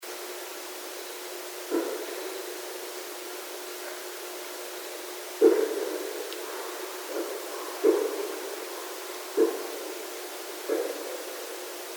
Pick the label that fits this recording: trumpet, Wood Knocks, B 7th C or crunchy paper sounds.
Wood Knocks